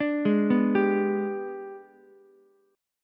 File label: Ab Minor Major 7th Flat 5th, down, Ukulele; AbmM7b5; arpeggiated